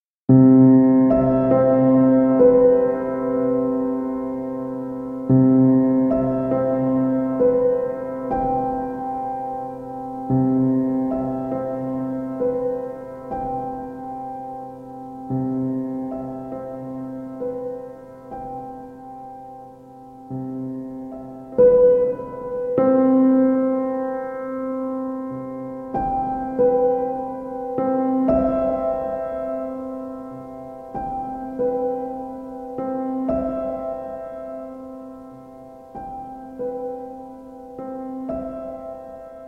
Piano Sounds

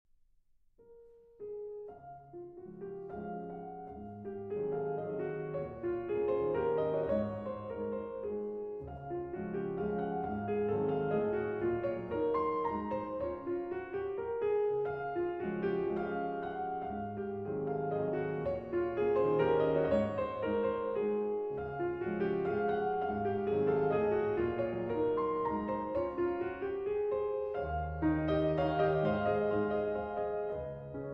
Waltzes, Moderato in D-Flat Major

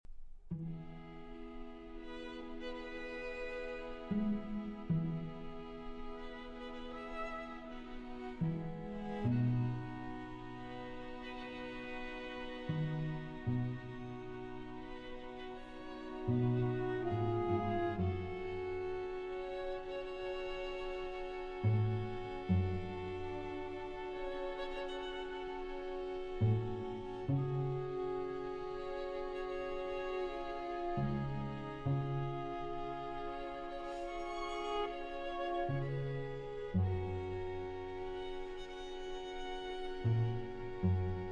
String Quintet in C Major, Adagio

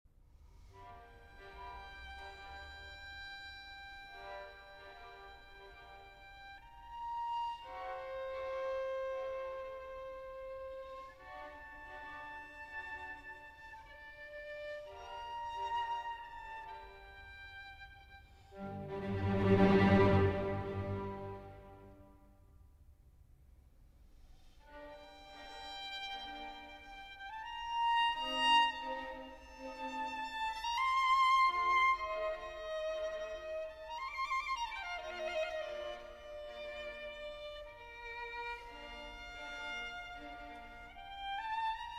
Violin Concerto in G Minor; Vivaldi; The Four Seasons; Op. 8 No. 2, RV 315; Summer

